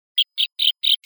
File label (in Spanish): alarma, especies amenazadas; conservacion de especies amenazadas; biodiversidad